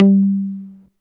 TAKKER G2, TAKK BASS, Yamaha VP-1; Music Store

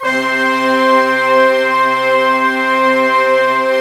ORCH C4; AKAI S-Series CD-ROM Sound Library VOL-2

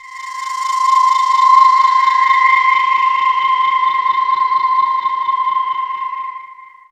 Flutta Pad; Pads

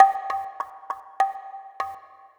Small Drum; Sonic Foundry (Sony Creative Software); Myths of Technology; Acoustic Beats

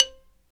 XYLO, Afro Xylophn

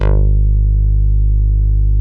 CONCRETE BASS; Masterbits; Dance Trax

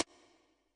snap, Yamaha Tenori-On Snapkit

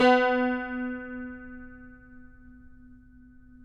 CUMBUS B, Guitars; Spectrasonics; Hans Zimmer Guitars VOL-2

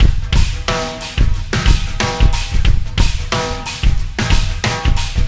Landing Party Beat, Processed Drumkits

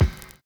SLOWPITCH

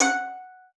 F#5, VIOLIN SPICC; Xsample VOL-5

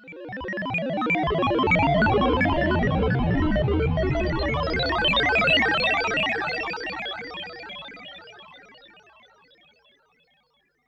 Classic S H, sci-fi

